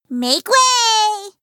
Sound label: battlecry; hat kid; a hat in time